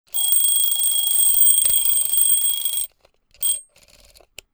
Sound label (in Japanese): mezamashi